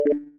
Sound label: leave voice room; flotilla